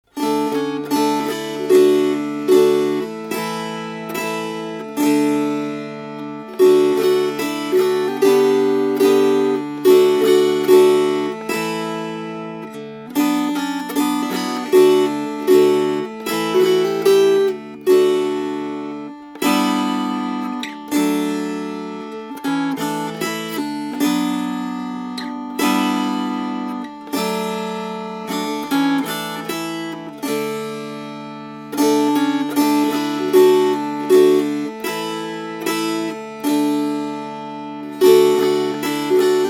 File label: Strummed